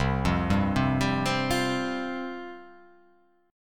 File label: C Suspended 4th C